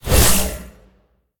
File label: fire; Smite; Tiamat